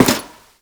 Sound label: Step Default; Warframe; The New War; Characters; Veso